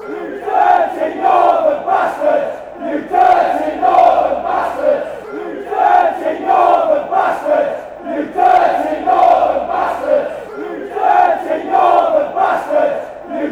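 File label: football chants; dirty northern bstards; oxford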